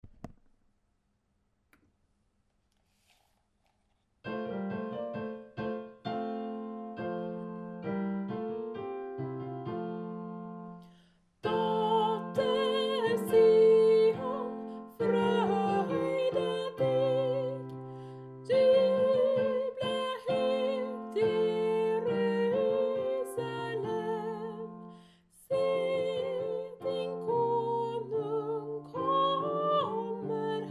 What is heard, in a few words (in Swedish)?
tenor; dotter sion; adventskor